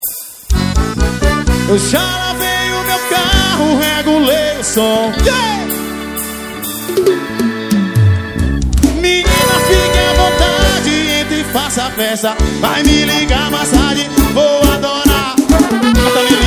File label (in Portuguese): forro